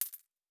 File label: Coin; Fantasy Sounds Bundle